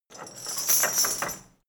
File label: drawbridge chain handle; soundpool